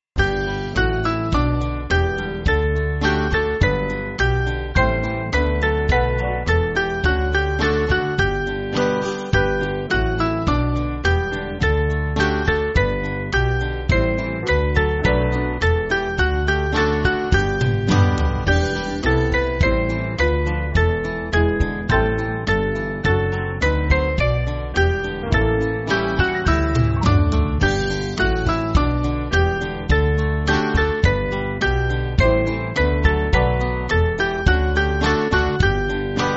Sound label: Holy Spirit Ever, Band; In Babilone